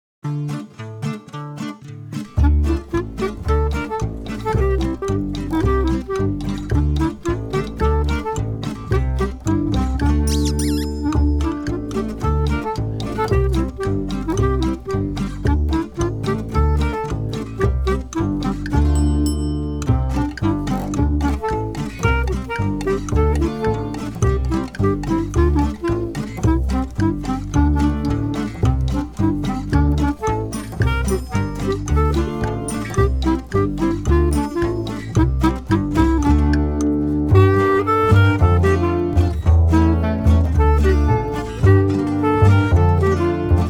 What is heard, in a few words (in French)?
version instrumentale